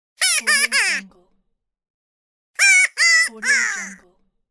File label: cartoon character ha ha ha snicker